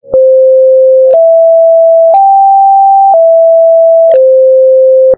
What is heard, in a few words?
sine